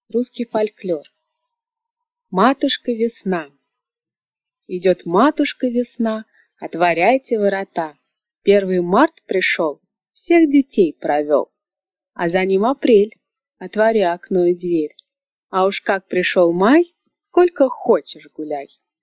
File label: folklor matushka vesna